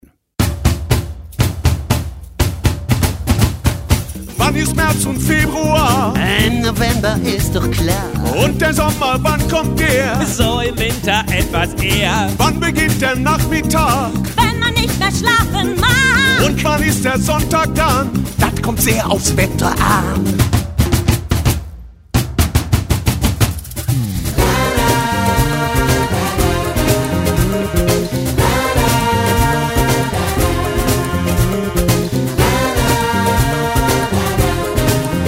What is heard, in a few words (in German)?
Ramba Samba